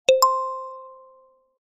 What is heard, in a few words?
message